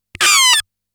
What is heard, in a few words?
Aliens Monsters; FXHome